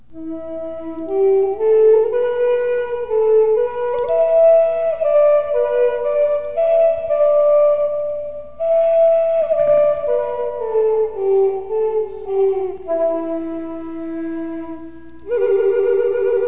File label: Em flute